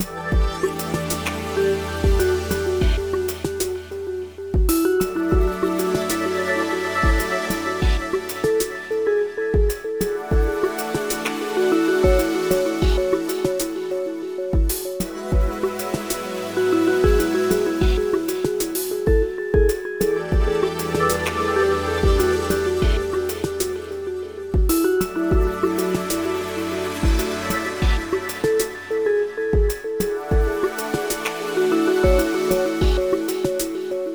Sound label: Menu